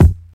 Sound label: smooth rap kick drum sample f key, Royality free bass drum single hit tuned to the F note; 90s; kick drum samples